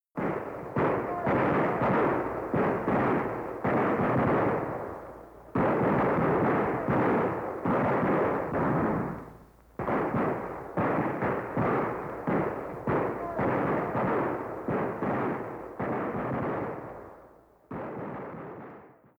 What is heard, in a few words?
distant guns; gunshots in the distance; Agnew's statement on the killing of student protestors at Kent State